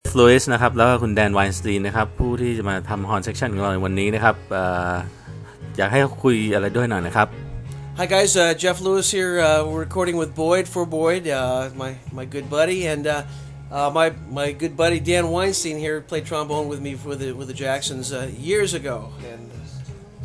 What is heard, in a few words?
horn section